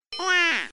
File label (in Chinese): 婴儿开心